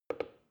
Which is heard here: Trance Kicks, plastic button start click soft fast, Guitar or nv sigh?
plastic button start click soft fast